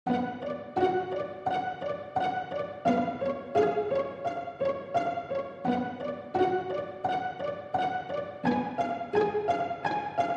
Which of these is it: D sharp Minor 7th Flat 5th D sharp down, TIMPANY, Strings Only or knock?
Strings Only